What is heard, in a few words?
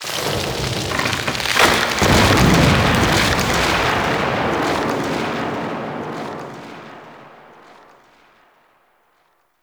Forest Distant Tree Fall; Warframe; Ambience; Grineer Forest; Titania Quest